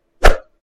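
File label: zvuki vzmaha, swing whoosh sounds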